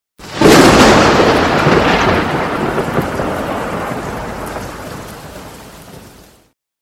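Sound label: sound of thunder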